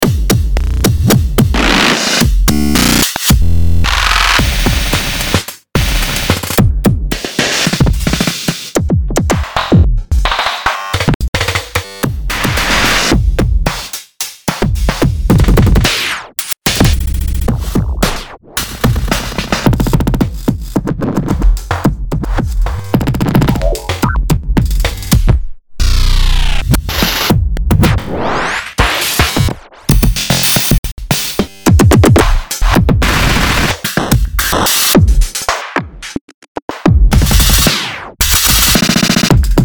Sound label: GLITCH DRUMS